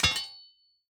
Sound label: mine metal